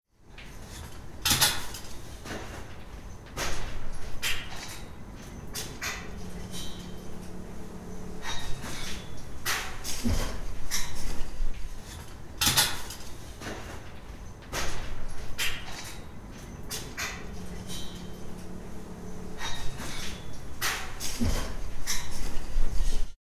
construction site

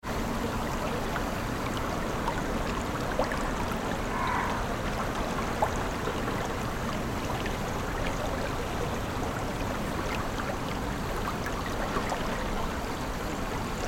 river

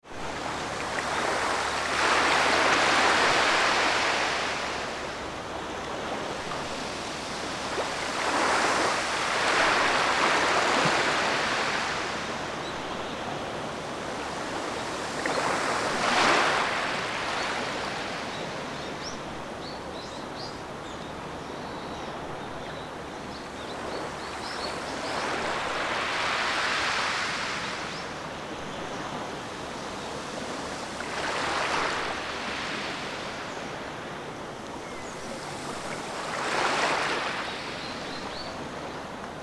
wave birds twilight

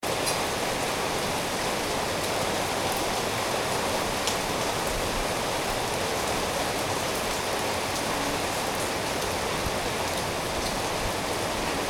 rain

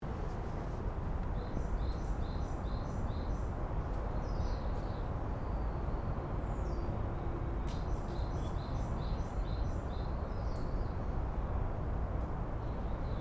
Atago Shrine

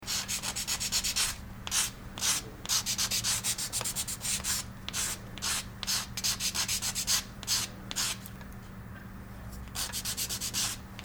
craftsmanship

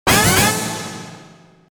JINGLE

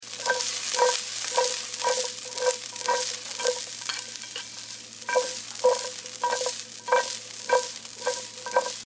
vegitable stir fly

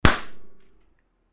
burst

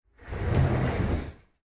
Shoji open

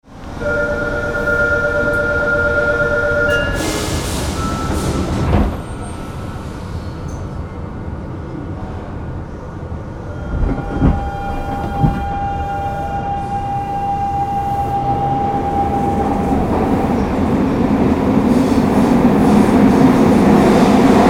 subway

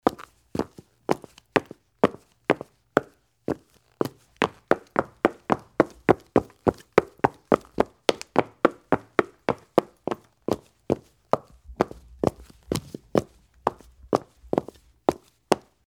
footstep Geta

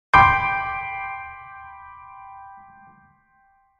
piano

